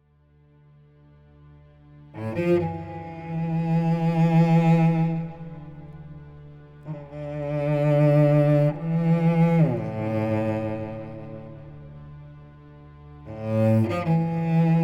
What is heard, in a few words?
Cello Version; Muhteşem Yüzyıl Kösem; series soundtrack